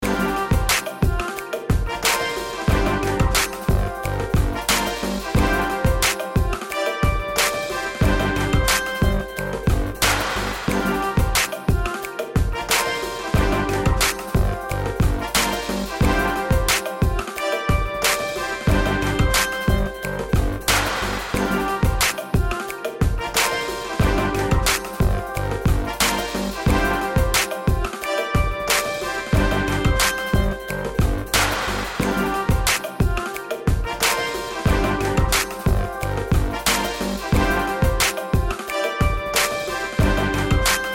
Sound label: Win Theme